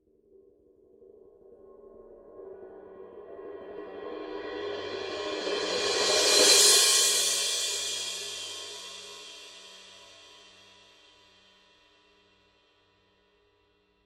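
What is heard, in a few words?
signature 16 power crash swell, cymbales; Forgées à partir d’un alliage secret de bronze; paiste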